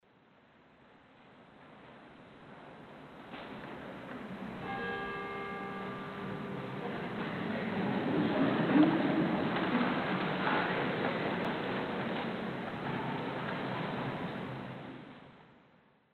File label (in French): cloche; mozart c moll; concert st joseph 2004; choeur artemusica